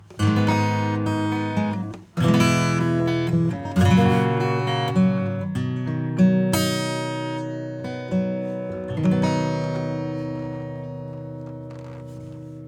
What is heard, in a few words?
acoustic; Unisum